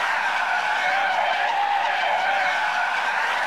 Skid Mat Asphalt; kart racing; Boom Karts; Android

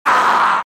Monster Yell

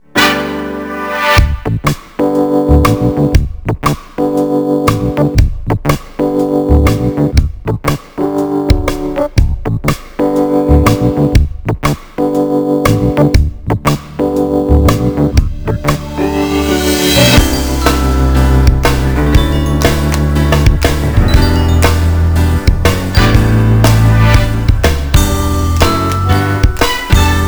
Theme Song (Instrumental); Little Charley Bear Toybox of Fun; NDS

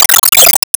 Enemy Temple Active Cancel; Se Enemy Temple Active Cancel

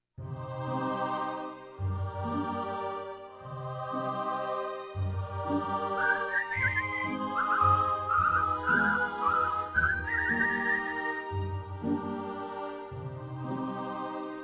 Swamp loop; shrek 2 activity center twisted fairy tale fun

